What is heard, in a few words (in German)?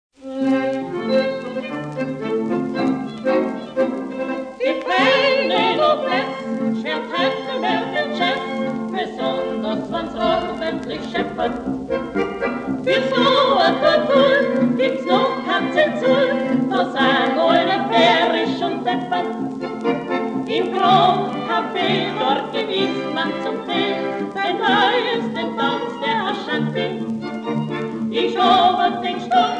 Zwa Fiedeln a Klampfen a Maurerklavier